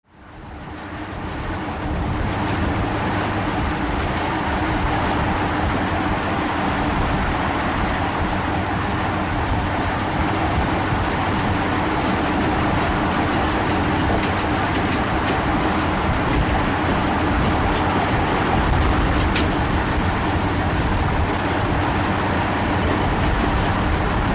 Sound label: Truck Diesel Onboard